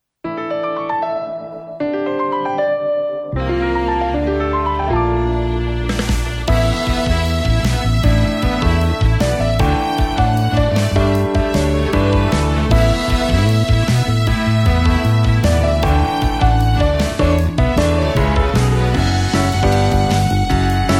ニュース・タイム

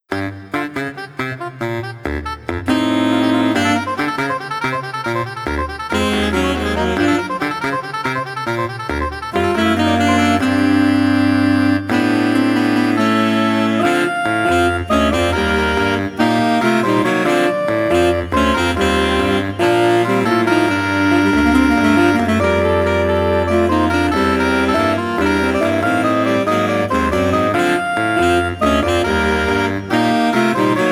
サックス・アンサンブル

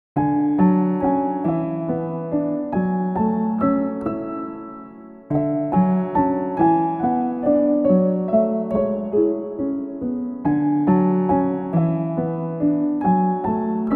別れのシーン

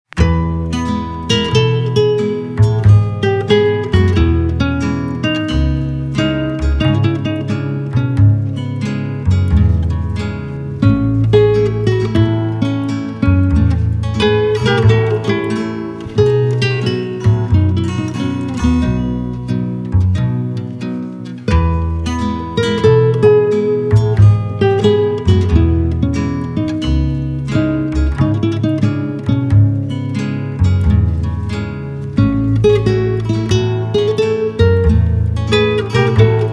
ハワイアン

